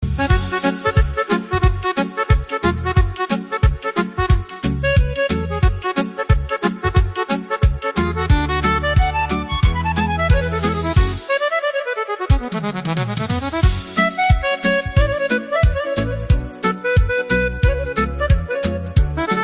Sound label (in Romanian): Improvizație acordeon